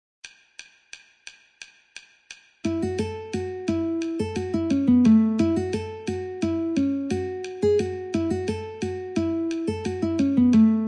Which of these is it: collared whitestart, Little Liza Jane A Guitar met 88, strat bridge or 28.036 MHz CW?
Little Liza Jane A Guitar met 88